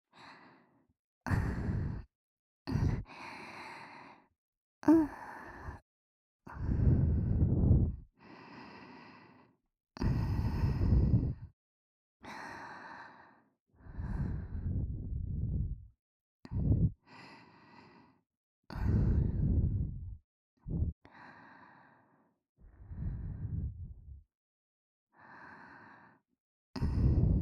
ASMR 寝息; えろぺろ

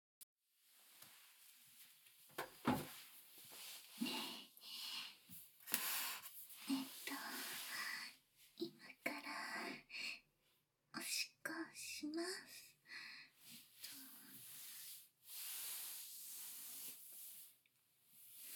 おしっこタイム

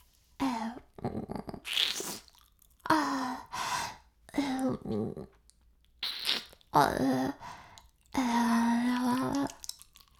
フェラ音声, ASMR; 白くて黒い魔性円環

